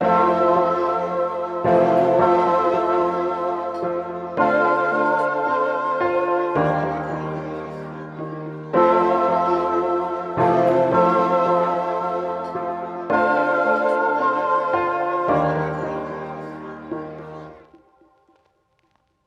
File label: fmaj7 dm7 dmaj7